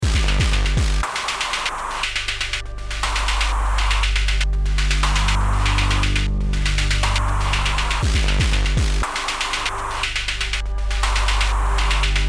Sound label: start round, music